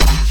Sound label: Stab G, bass